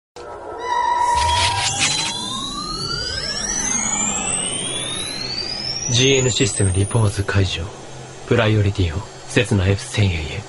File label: system booting up